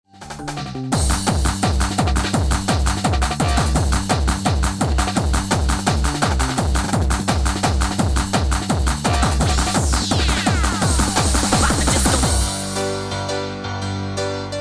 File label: Trance Remix